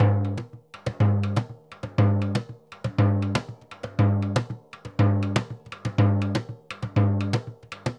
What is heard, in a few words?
Surdo Samba 120, Drums & Percussion; 120 bpm; Best Service; Festa Latina VOL-1